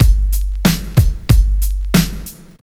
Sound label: TRIP 90, trip loop